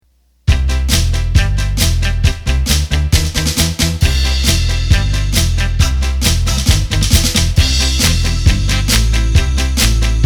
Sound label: Come Rock; roland bk7m